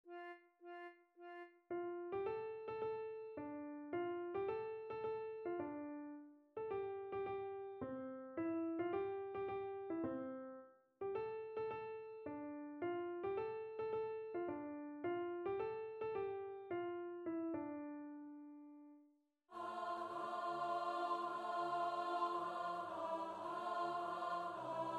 ALTO STUDY

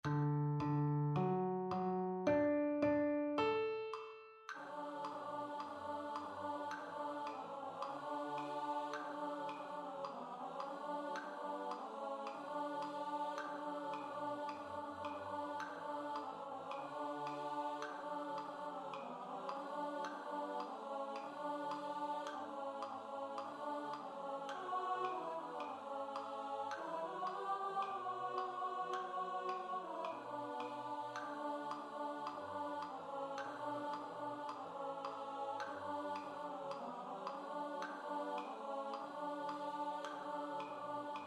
ALTO Study

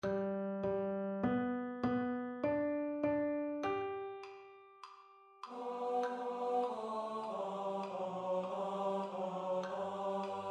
Im Marching Marching TENOR STUDY

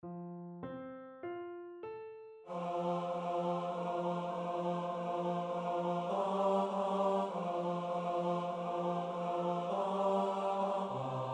Twards a World BASS STUDY

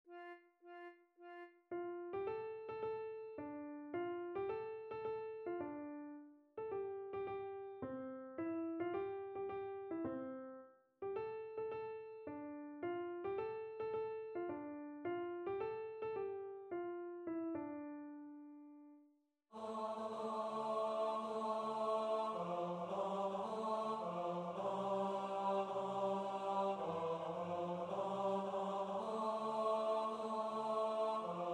TENOR STUDY

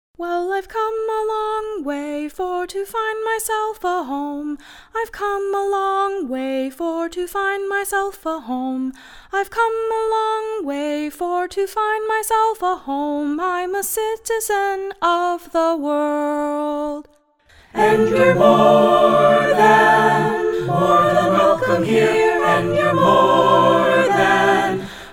PEACE CHOIR

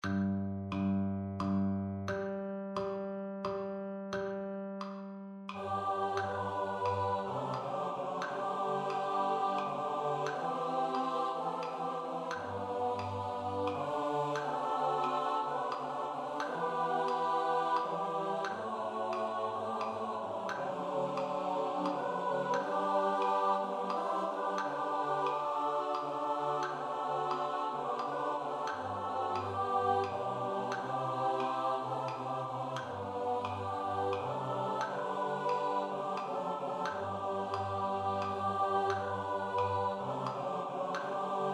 Relieving Suffering SATB STUDY

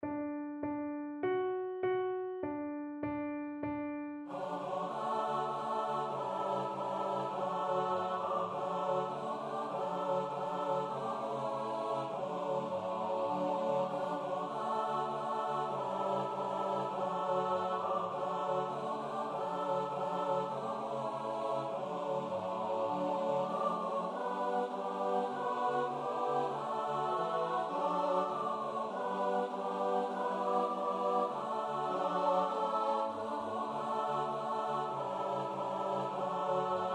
Because Violence SATB STUDY

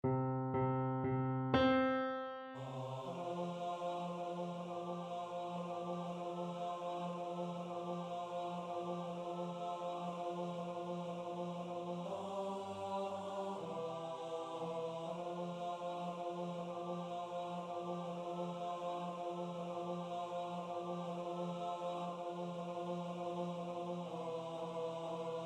TENOR STUDY

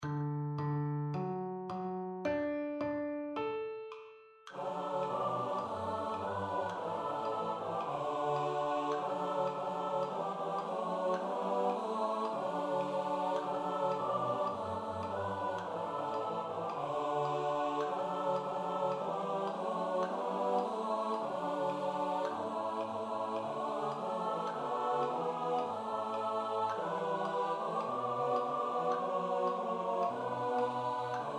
SATB Study

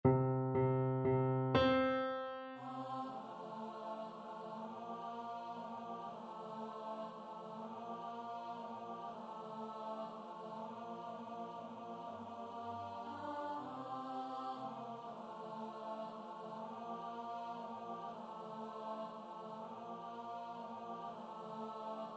ALTO STUDY

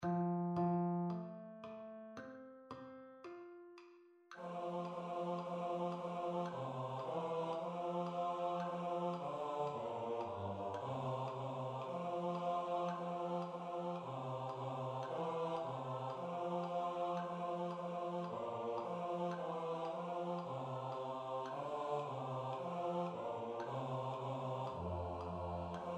BASS STUDY

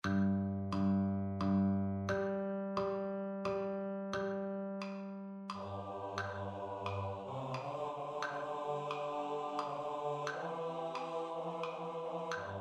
Relieving Suffering BASS STUDY

